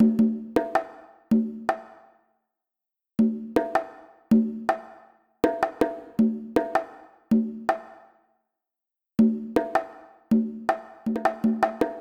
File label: Brazil Dream, ambient